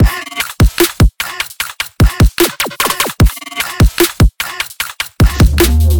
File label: smooth drums processed; kern audio